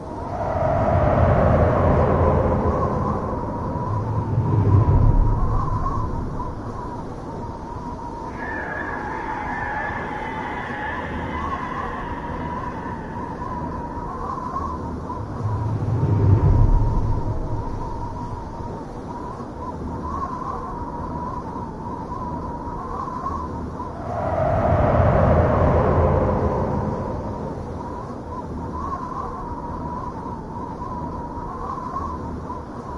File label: glow atmospheric background